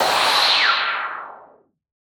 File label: Anime Super Blast Off